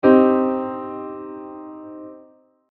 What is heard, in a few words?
minor chord; minor triad